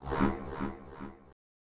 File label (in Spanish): plataforma Roca; Grounch